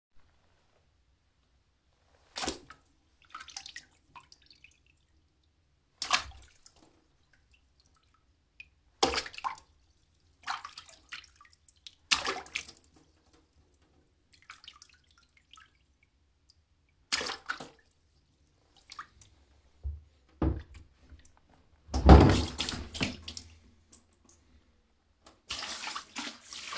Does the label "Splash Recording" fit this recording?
Yes